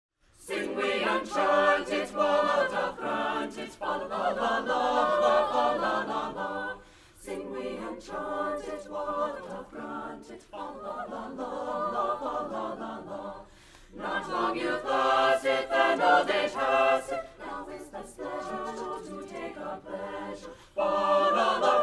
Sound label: Sing We And Chant It